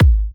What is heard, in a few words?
Kicks G#; markus hakala